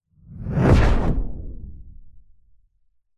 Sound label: sound design cinematic whoosh dark into a crack bolt impact; zapsplat